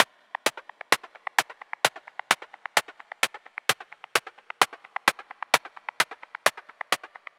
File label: Top Loop 130, Percussions; Loops; Molecule; Ghosthack; Drum Mega Bundle Demo; Abstract Percussions Volume 4